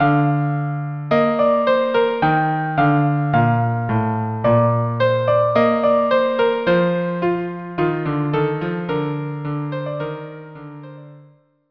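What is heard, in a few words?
violin cello; LEsperance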